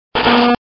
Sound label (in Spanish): Grito